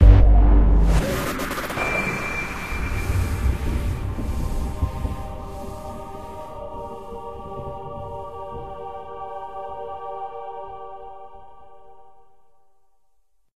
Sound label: death messages player